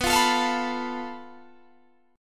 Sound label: A Suspended 2nd Sharp 5th